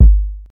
tight lows bass drum g sharp key, Royality free kick drum sample tuned to the G# note